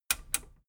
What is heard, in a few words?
metal button radio click enable slow